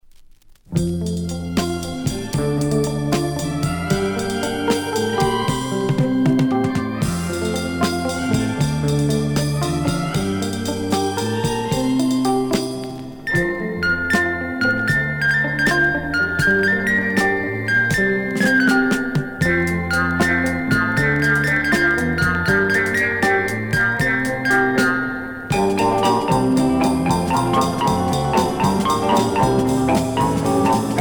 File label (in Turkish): Sentetik Oyun Havalar, Rast Saz Semaisi